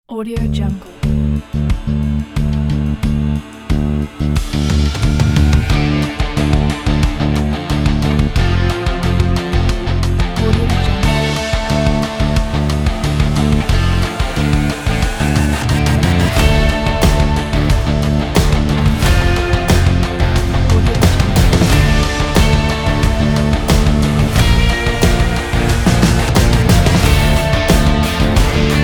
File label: tense rock trailer